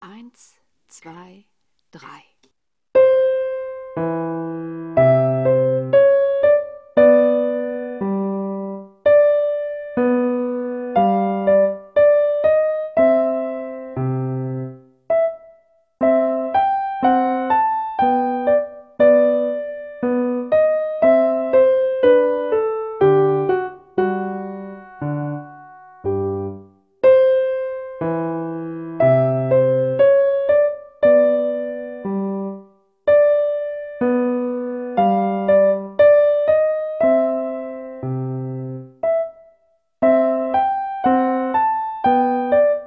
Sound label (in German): Menuett in C-Dur, Piano; Play Piano Klassik